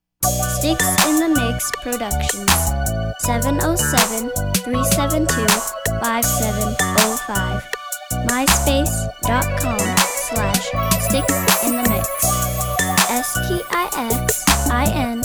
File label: funky laidback jam